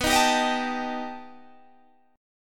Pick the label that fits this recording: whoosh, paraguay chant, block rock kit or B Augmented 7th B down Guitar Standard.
B Augmented 7th B down Guitar Standard